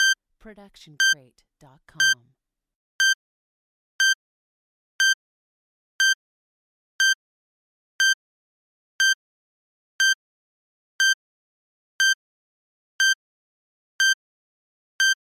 Bomb Timer Beeps

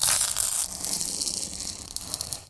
Nails on Chalkboard; soundscrate

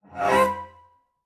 Cello Scary Movie; SoundsCrate

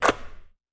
basketball swish

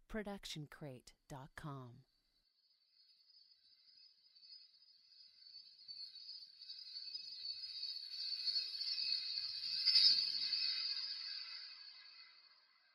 reverse shimmer; soundscrate

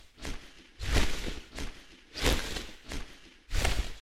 loopable wing flaps; soundscrate

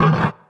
monster roar short; soundscrate

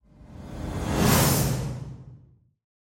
Fast Horror Riser; SoundsCrate

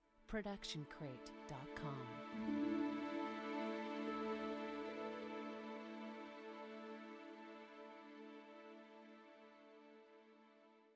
feel good strings plus harp; soundscrate

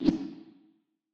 anime whoosh

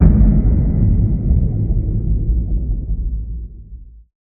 underwater hit; soundscrate

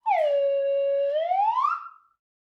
Slide Whistle Down and Up; SoundsCrate

